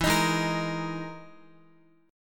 E-Major Sharp 11th E, Guitar; EM#11 strummed

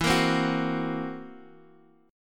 Gb 7th Flat 5th E

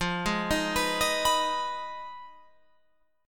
F Minor 7th Flat 5th, Guitar, Open F; Fm7b5; arpeggiated

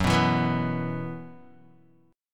Gb 7th Suspended 4th Gb down Guitar, chord; Gb7sus4; strummed; JGuitar

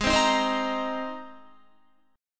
Ab Major Add 9th Ab, Guitar; Abadd9; strummed; 15,15,15,17,15,18

